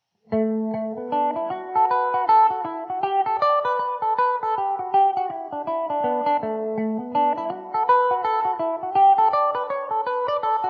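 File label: D major, irish mandolin; Mandobird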